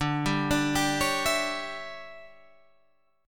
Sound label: D Major 7th Suspended 2nd Suspended 4th D down Guitar Open G